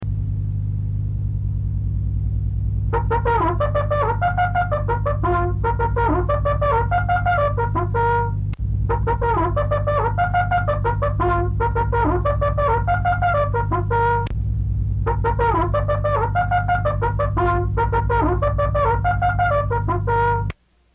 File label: General Quarters, bugle calls